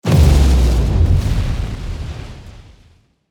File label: damage hits explosions, Warships